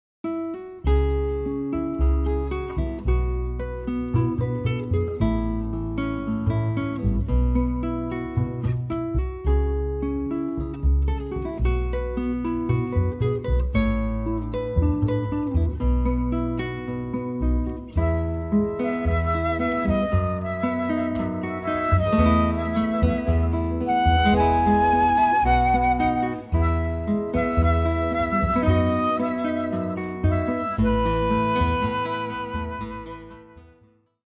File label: guitar flute bass trio